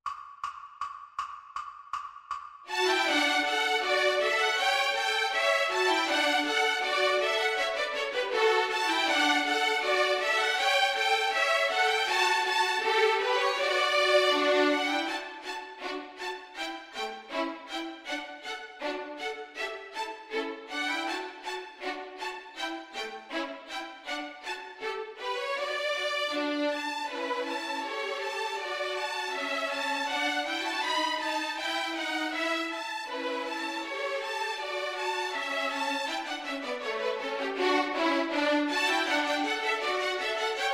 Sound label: Backing Track; Morris Dance; Honeyman; ViolinSchool